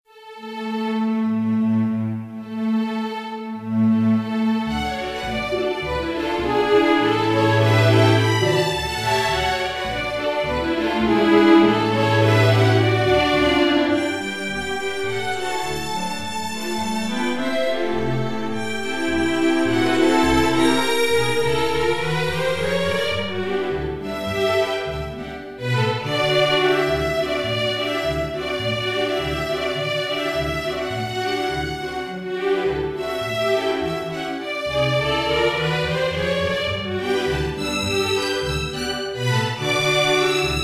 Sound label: string orchester